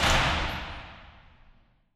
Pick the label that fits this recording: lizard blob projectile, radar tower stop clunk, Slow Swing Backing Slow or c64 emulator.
radar tower stop clunk